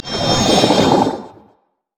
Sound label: surging tides; LoL; nami